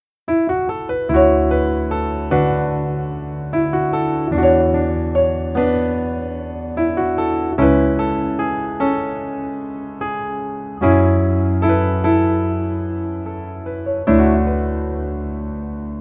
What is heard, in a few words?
Piano